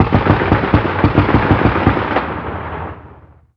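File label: gun 50cal distant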